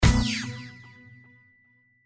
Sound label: throw; Fluffy Favourites Lucky Tap